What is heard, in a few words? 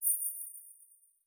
Magic Chimes, Fantasy Interface Sounds